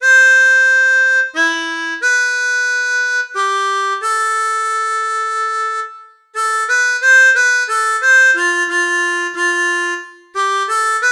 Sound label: Musetta's waltz, harmonica